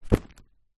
cool bag fabric set down

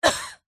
human girl teenage 13 years short cough; zapsplat

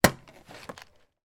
office file box metal open

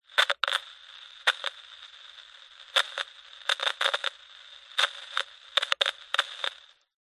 cb radio cheap static through speaker clicks

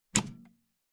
air fryer oven door open; zapsplat; household

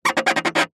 cartoon squeak rub glass fast

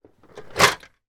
leisure board game game box open; rummikub

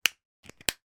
office sd card put in case case close; sound effects one

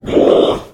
Demon; little robot sound factory

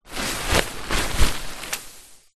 tent movement hand on side wall inside sheet; foley

